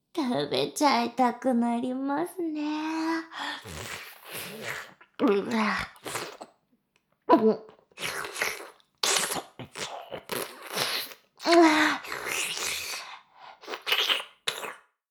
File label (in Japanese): フェラ音声; 牧場戦腺; 少尉